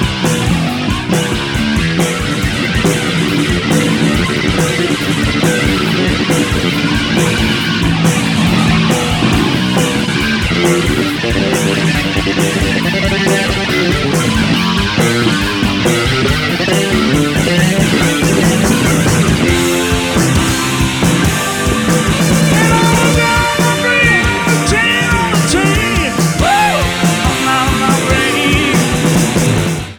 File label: Bass Solo